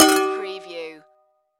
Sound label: Impact Pot; Action, Impacts & Explosions